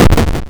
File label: explosion